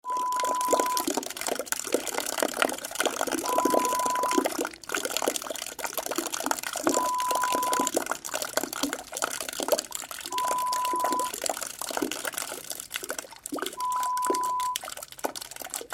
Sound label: rain gutter sound